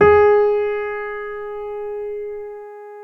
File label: PNO MF; Synclavier Sampler Library